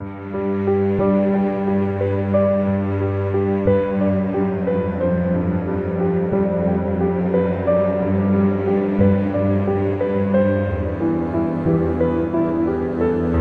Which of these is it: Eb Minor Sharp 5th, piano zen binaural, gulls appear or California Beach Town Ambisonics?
piano zen binaural